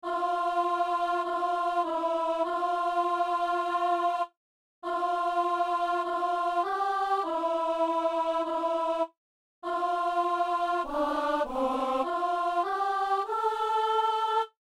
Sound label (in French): trois voix alto; Saint-Denis; Dieu est amour